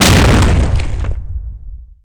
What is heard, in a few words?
claymore explode, weapons, explosive claymore; garrysmod